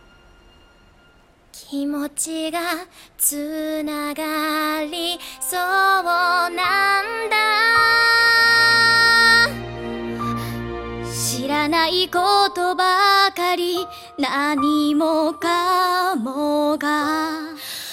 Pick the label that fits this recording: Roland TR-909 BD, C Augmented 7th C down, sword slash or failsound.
failsound